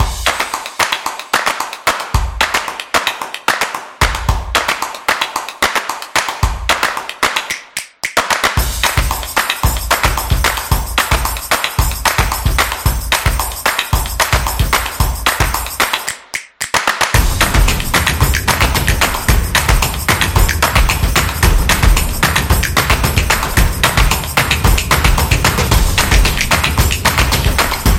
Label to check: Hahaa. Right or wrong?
Wrong